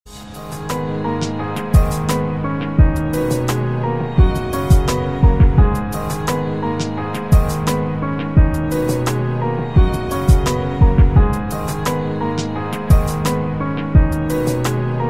violin mix

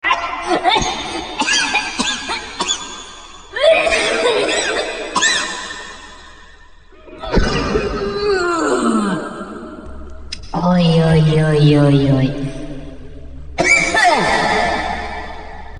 Smoker Coughing

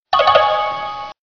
buzzer

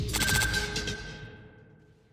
lock on; Omega boost

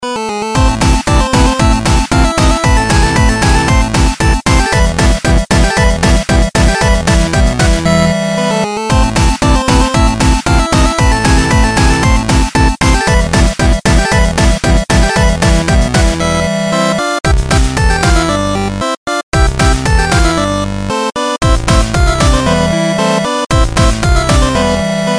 BACH BEATS

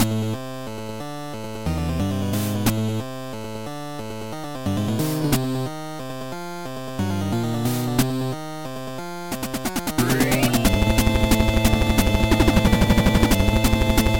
8bit Battle, ringtones

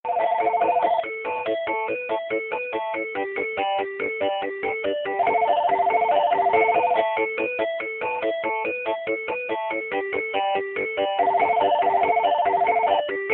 meadow

